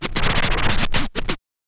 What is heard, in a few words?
scratch kit, vinyl; Masterbits; Vinyl Attack